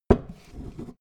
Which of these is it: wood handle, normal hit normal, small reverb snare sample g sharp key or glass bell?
wood handle